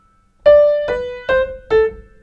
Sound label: piano down